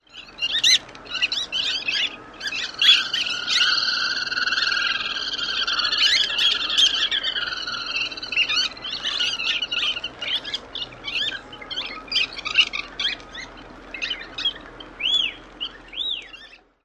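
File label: Black Turnstone calls of flock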